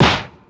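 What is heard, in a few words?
foul kick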